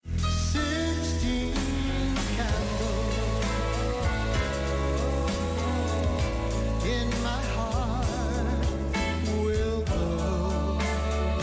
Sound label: Rock Pop Doo Wop